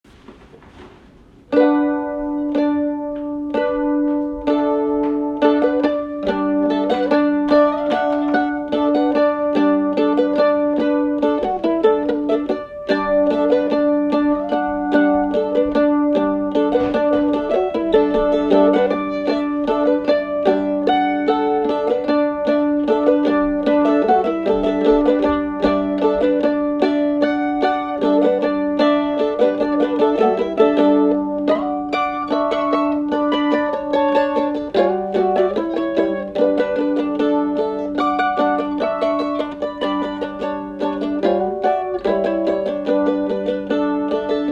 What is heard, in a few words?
Morning Mandolin